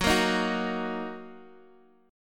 Gb 6th Gb down Guitar DADGAD

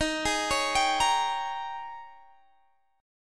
G sharp Major 7th Suspended 2nd Suspended 4th

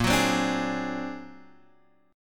A sharp Augmented 9th A sharp Guitar Open G

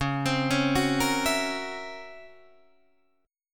C sharp Minor Major 13th C sharp Guitar DADGAD; C#mM13; arpeggiated

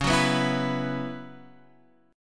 B Suspended 2nd Suspended 4th

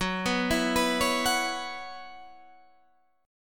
F sharp 7th Suspended 4th; arpeggiated; 14,14,14,16,14,14; F#7sus4 Chord

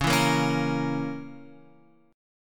C sharp Minor 6th; C#m6 Chord {9 7 8 6 X 6} strummed

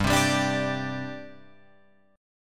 G Suspended 4th G down Guitar Open F; Gsus4 strummed; JGuitar

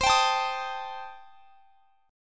C Minor 6th, chord; Cm6 strummed; x,x,22,24,22,23

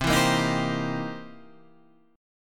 C 7th Sharp 9th C Guitar Drop D

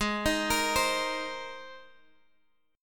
Ab Major Add 9th Ab Guitar DADGAD; Abadd9; arpeggiated; 18,18,20,17,x,x

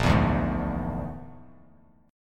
Bb-7th Flat 5th-Bb, Bass Standard, Bb7b5 chord; strummed; JGuitar

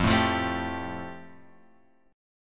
F Major Add 9th; Fadd9 Chord {1 0 3 2 X 3} strummed

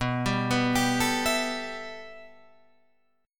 B 7th Suspended 4th Sharp 5th, Guitar, Open F; B7sus4#5; arpeggiated; JGuitar

